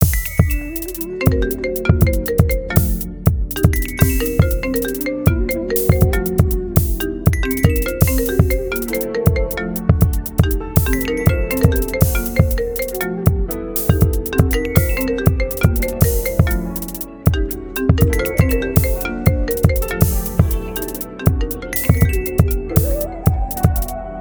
iphone 11 Pro Max Original Trap Flute Remix Ringtone